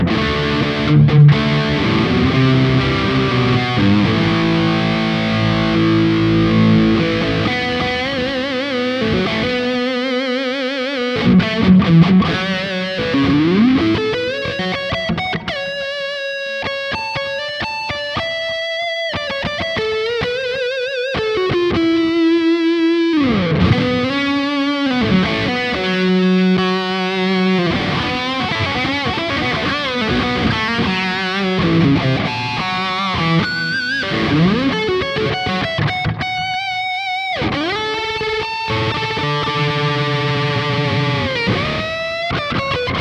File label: Neck to Bridge Alternate Switch up Highgain; Ibanez AZS2200 Prestige